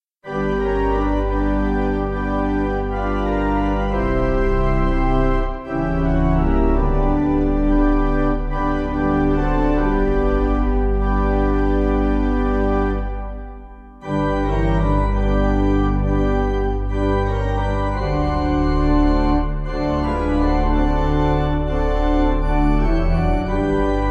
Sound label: Pipe